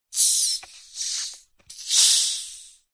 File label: cola bottle open lid hiss; zapsplat